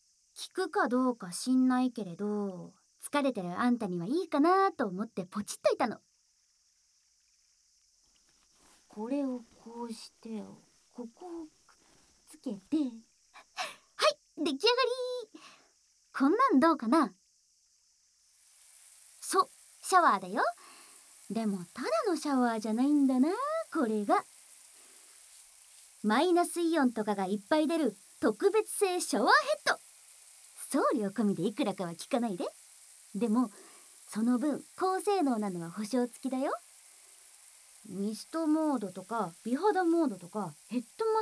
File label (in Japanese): シャワーでリラックスタイム！